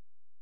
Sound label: C#, Synclav FM, FX; Big Fish Audio